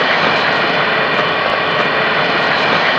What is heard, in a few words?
AIR, INDUSTRIAL; TEK AIR